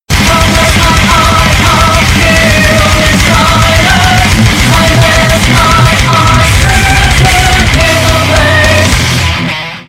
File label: intro music; ff2; zanki